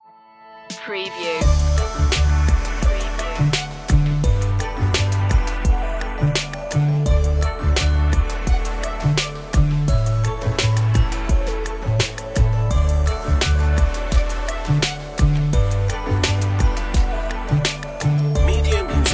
kids hip hop

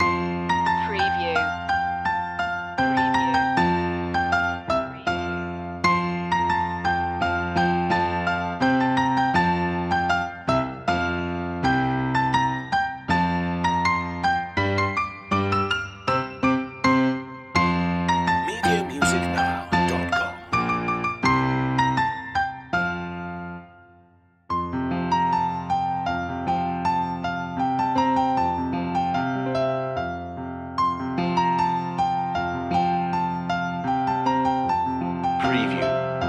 piano loop long; deck the halls; media music now

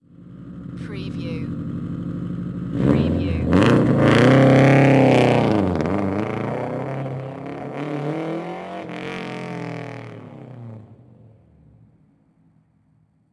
SUBARU STI EXHAUST TAKEOFF

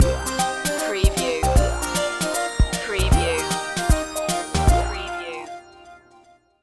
retro video game pipe world stinger

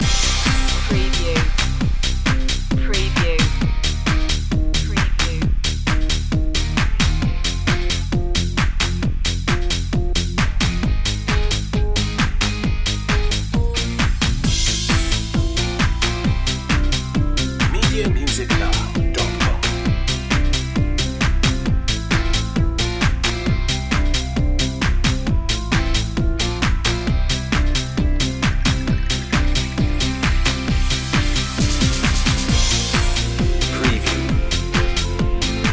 rock the house loop

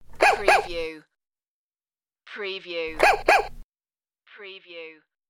Toy 4 Honks

